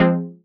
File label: stab simps, stabs